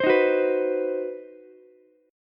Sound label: C Minor Major 7th Flat 5th chord, Ukulele; strummed; JGuitar